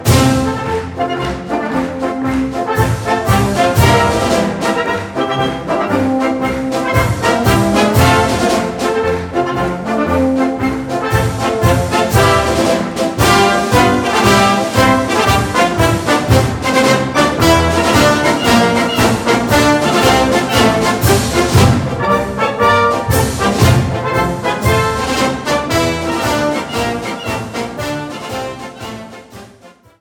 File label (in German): marsch